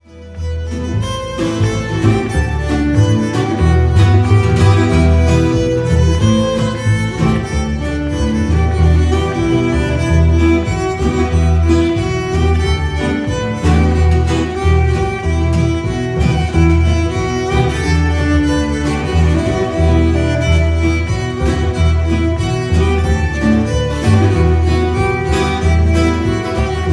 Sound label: Breton tune; Celtic